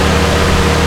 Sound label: Jeep rpm3, Engine; Cars; XBOX